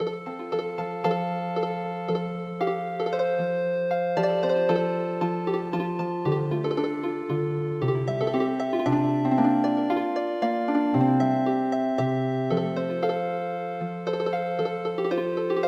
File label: Healing Harp; Torachi